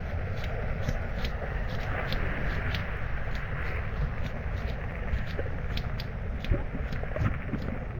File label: lava, liquid; Minecraft; Conquest Default